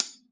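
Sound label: Raw Hi-Hat, Drums Acoustic; USB Soundscan vol.10; AKAI; 90s sample CD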